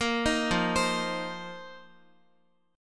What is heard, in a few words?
F 7th Suspended 4th F down Guitar; arpeggiated